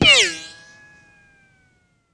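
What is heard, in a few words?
ricochet concrete; czero opforce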